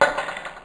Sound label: keys